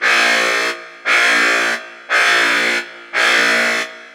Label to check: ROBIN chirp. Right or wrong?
Wrong